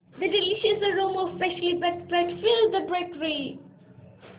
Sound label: euphoric